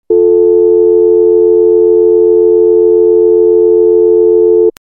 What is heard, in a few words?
Dial Tone; xp-80